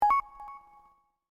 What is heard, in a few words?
message receive; chat